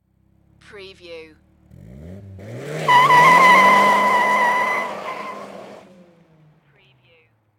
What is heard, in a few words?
NISSAN SILVIA EXHAUST TAKEOFF